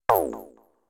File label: menu close